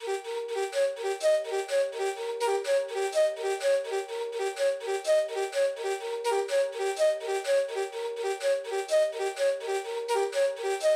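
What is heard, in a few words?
Ethnic Loop 125 Flute G minor; Ethnic Loop Vibes V2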